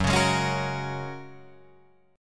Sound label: Gb Major Add 9th, down, Guitar, Drop D